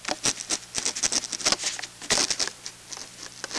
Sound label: plastic scratch